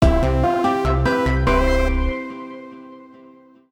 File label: MUSIC EFFECT Platform Positive Slow; stereo; Universal Sound FX; MUSIC EFFECTS